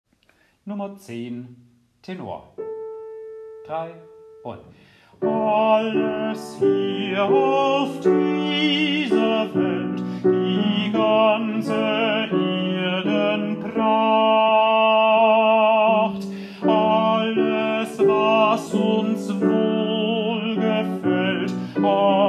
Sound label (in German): Tenor; Dioezesan Caecilien Verband; DCV Chorfahrt; Übaufnahmen